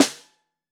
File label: Bottom, Snare; Ableton Foundation FreePacks; Snare Designer